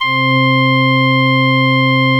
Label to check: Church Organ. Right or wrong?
Right